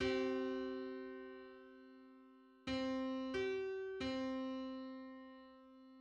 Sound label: Hundred-seventy-fifth subharmonic on C